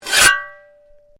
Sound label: Shovel Scrape; Working with a shovel or spade; Objects